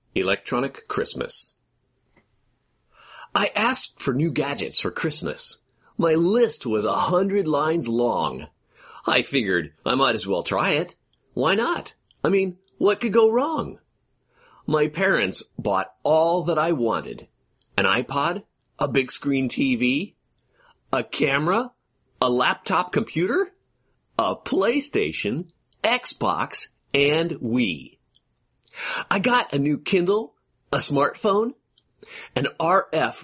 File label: Electronic Christmas